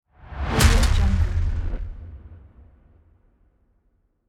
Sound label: scary trailer hit